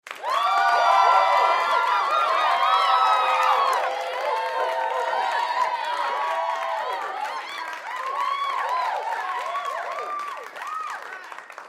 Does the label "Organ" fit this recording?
No